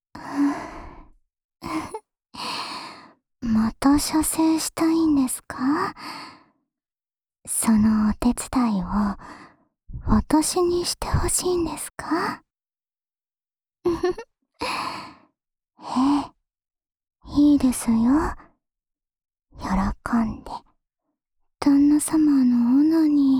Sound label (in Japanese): オナサポ用耳舐めループ 右, ASMR